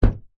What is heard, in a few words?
vehicles car bonnet hood hit footstep bang ext